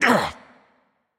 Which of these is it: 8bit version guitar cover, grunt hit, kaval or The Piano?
grunt hit